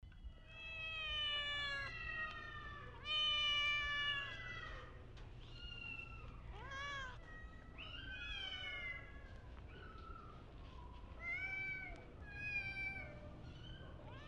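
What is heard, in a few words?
kennel, plaintive meowing of cats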